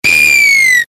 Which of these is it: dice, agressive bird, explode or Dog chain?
agressive bird